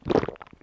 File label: Bottle Pour